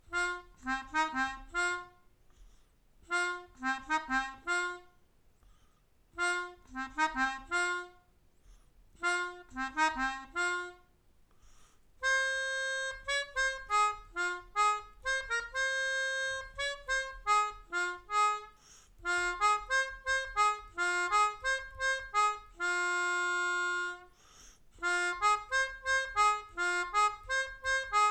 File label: Melodica